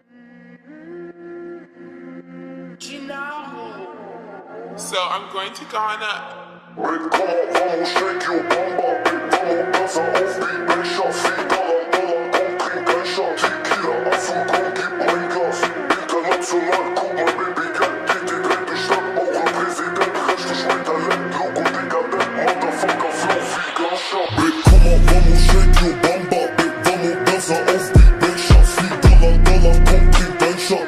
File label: slowed bass boosted